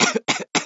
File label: cough